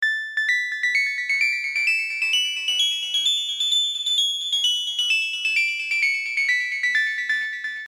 130bpm dx10

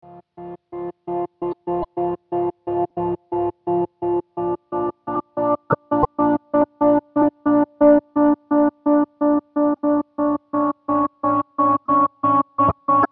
drone 90

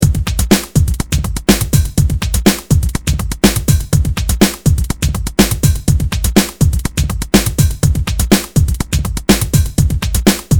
123bpm beat; nukearts

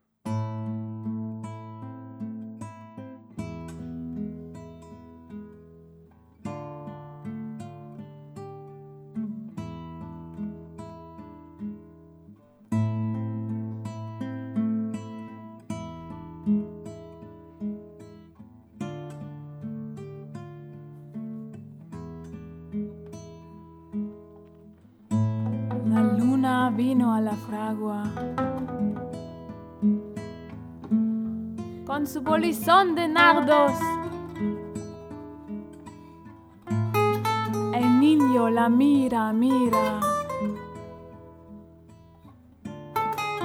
romance de la luna acoustic session

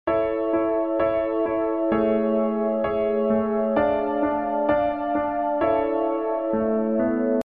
130bpm keys; plugsound

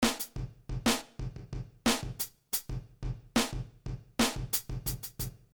drumloop 90

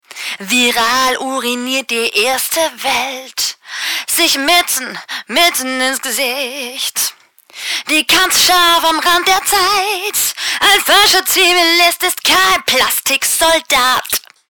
vox str

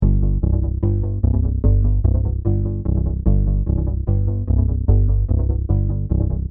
synth

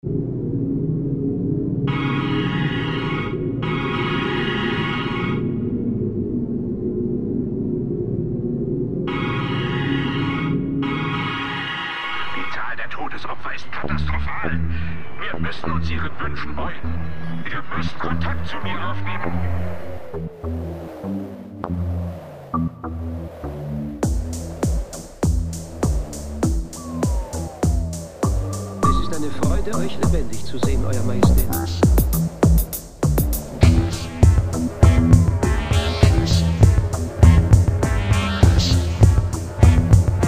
phaser

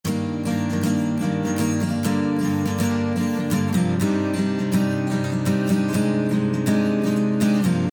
happy akkustik intro

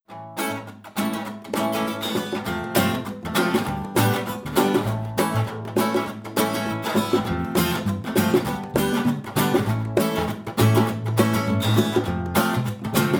acoustic session

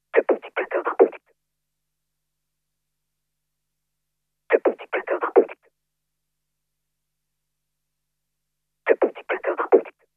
beatbox

